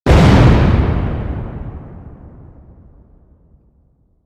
Explosion Blast Large

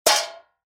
Weapon Armor Hit

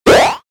Multimedia Internet CD Rom Flash Blurp

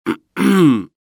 Human Male Clear Throat

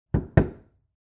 Household Door Knock Hollow Door

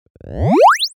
Multimedia Internet CD Rom Flash Beep Evolver

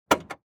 Transportation Auto Police Car Trunk Open

